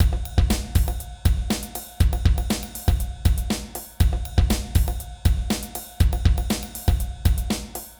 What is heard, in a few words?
Limited Drums